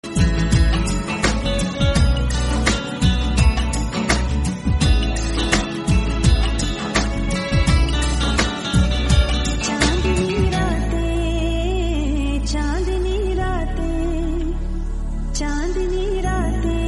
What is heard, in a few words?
free guitar music tone ringtone, bollywood ringtones